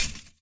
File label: chainlink tap; scripted sequences, a2